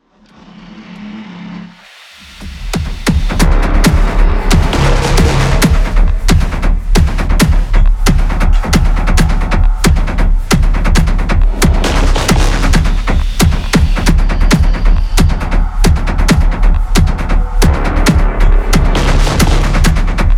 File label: Scale Fury Loop 135bpm; Furi; Windows gamerip 2016